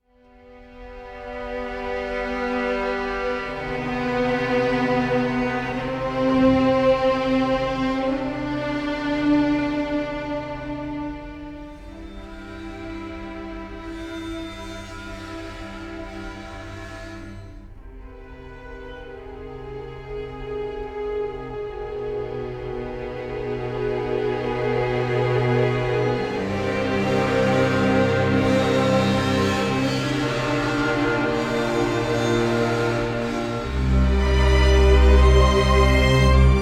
Exterior; Outlast

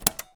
Panel Vcr Button Press, sound effects; Among Us

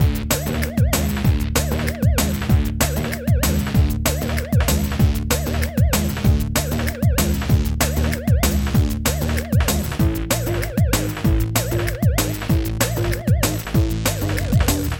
Cake Powerup; little britain the video game 2007 playstation 2

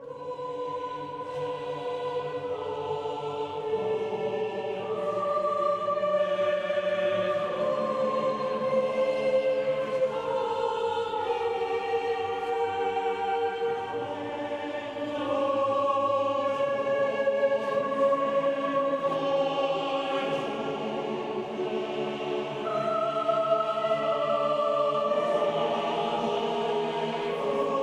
Cinematic Music

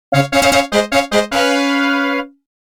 Fighting Fanfare; namco arcade sound digital collection vol. 1

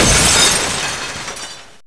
break glass

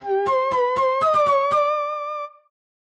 Flute Jingle

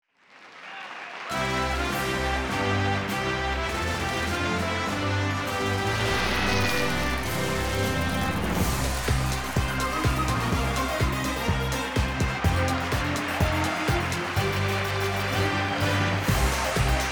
Intro Fanfare; POL

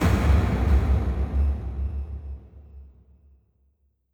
Suspense; Five Nights at Chuck E. Cheese's Rebooted

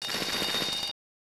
Coin Issuance; Hisshou 777 Fighter II; SNES

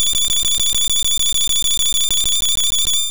Heal Bell; sfx; attack moves; pokemon; gen 2; gsc

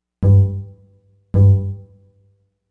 Bass

